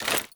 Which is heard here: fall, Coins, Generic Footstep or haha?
Generic Footstep